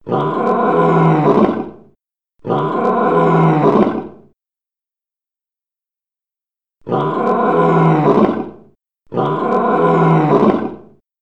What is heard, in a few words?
Lions Roar